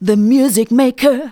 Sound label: vocals sung; Techno Trance Essentials